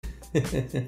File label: heheeh